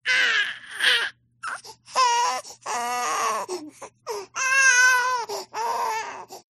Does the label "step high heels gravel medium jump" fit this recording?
No